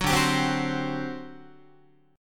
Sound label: D 11th D, down, Guitar; D11 strummed; JGuitar